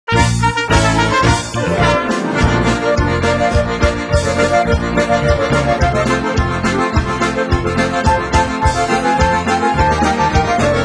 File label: Clarinet Polka